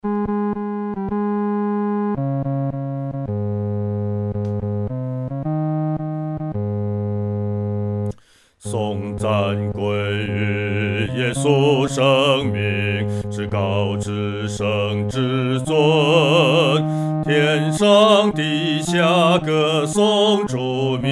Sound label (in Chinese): 独唱 第四声; 颂赞主圣名